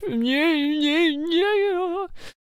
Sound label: Chariot Death Vocals